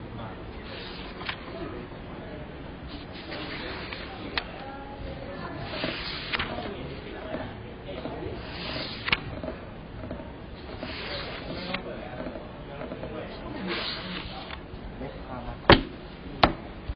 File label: book